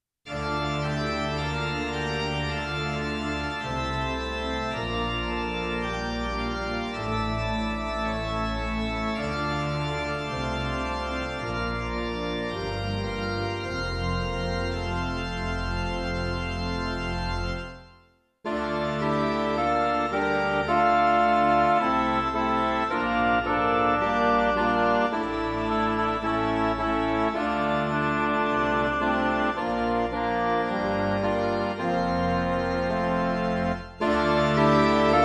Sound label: midi